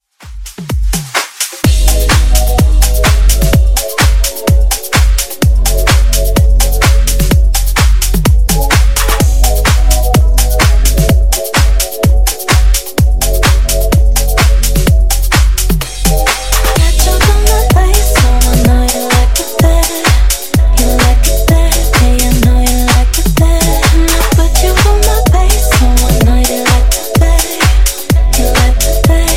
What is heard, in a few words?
Funk Nudisco 127Bpm